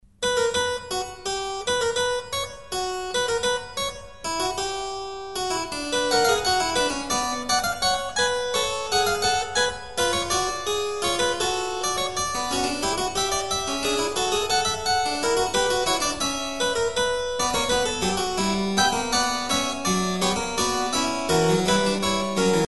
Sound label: well tempered clavier fugue c minor; bach